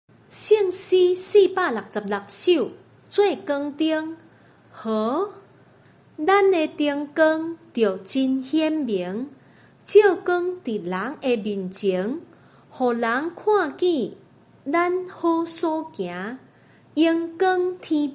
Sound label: Chorus, children; little light of mine